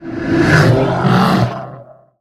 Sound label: Behemoth Talk, Monsters